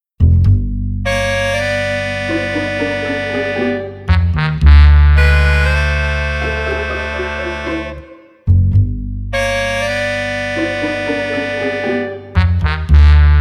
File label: Funny Orchestra